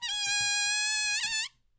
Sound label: Ballon, cartoon Foley Fx; musicradar; cartoon caper